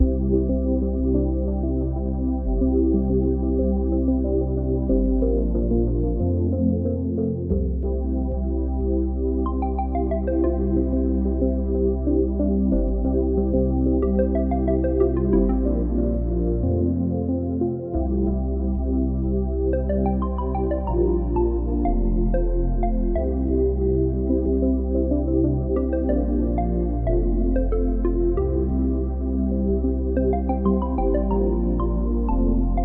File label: music game